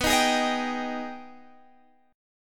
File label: G sharp Minor Major 7th B